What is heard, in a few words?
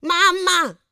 D BIT, Vocal R&B Dance